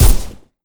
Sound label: MP7 fire; STALKER